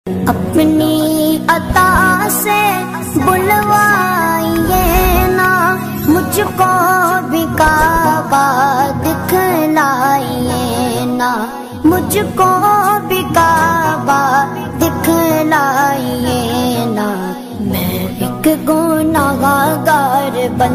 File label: islamic ringtone arabic song ringtone